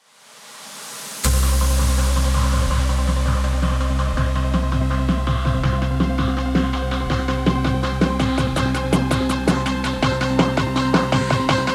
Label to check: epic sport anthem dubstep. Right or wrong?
Right